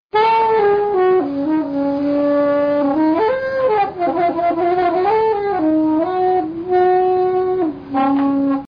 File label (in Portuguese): sopro